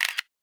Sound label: cam snap; LG; Optimus Vu F100S NewFW; camera